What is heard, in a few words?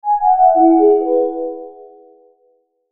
Logo Soft Synth Ascend; Logo Soft Synth Melody Descend Ascend Reverb; Blastwave